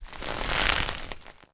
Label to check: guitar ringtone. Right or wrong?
Wrong